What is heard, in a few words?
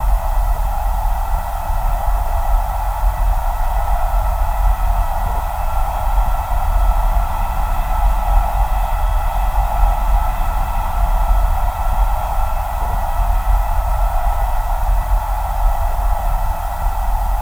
Torpedo Jet By, torpedos